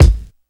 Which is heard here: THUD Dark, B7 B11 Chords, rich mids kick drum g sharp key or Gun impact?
rich mids kick drum g sharp key